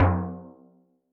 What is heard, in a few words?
Rubbr Oil; Yamaha DTXpress-II